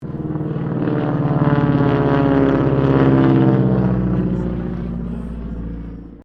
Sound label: AIRCRAFT AT-6D HARVARD MK III PASS